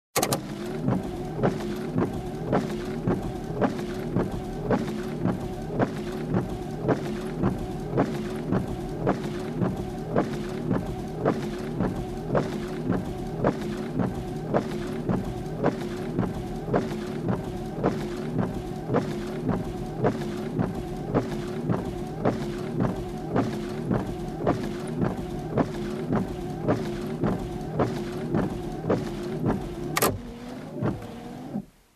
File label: Car Windshield Wiper fast